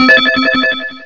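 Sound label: electric echo; HIGHTECH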